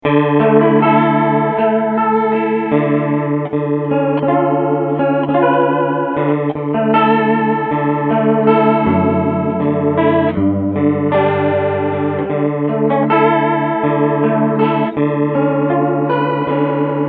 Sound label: CHORUS ORGAN